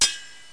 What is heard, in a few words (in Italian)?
spada; Interactive Media Design Review 1999